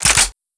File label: Gun Ready